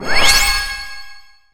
level

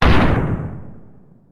devastator

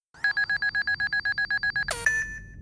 Sound FX life zero; Yu Gi Oh Duel Monsters

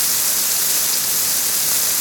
rain

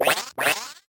frog

